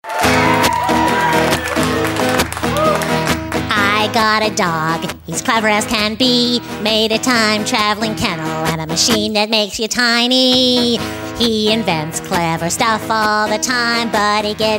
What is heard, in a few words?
character singing